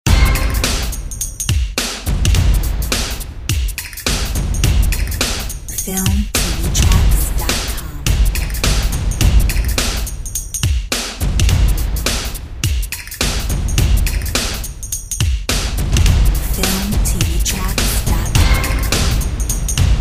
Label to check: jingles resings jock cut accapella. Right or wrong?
Wrong